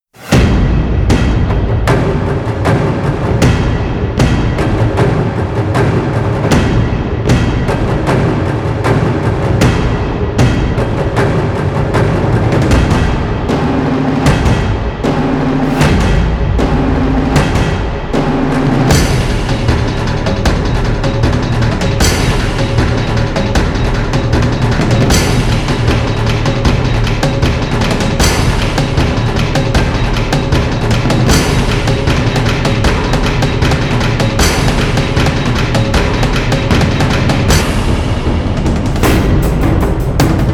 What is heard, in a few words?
Drums; possible intro music